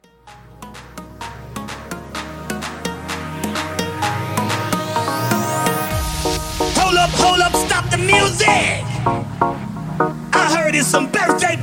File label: Latina 128